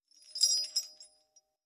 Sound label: Mecano Metal Move